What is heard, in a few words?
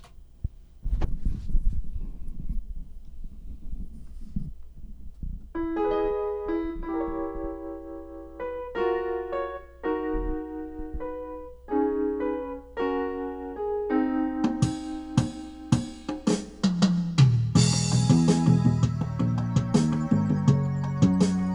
Smooth Jazz MIDI Mono